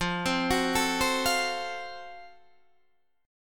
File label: F sharp Minor Major 7th Flat 5th F